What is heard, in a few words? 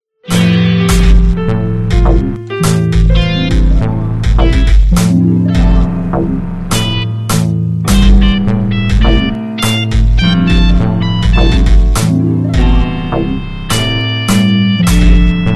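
instrumental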